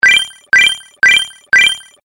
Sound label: Score Tally Game Loop; Video Game Sound Effects